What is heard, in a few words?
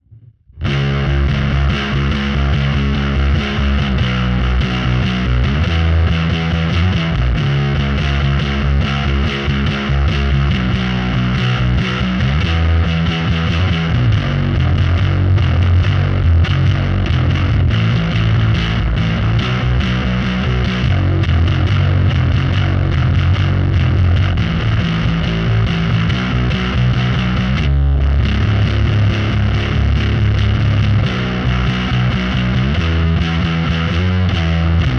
BASS SCOOP NO BOOST